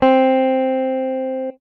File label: Guitar, guitar clean; Guitar and Bass; Ableton; Factory Packs